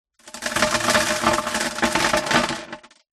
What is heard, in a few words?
foley dirt drop onto plastic box inside pov; zapsplat